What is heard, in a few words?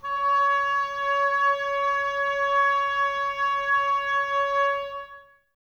OBOES C#, Woodwind Brass Ensemble; Miroslav Vitous